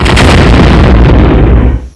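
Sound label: weapon explosion big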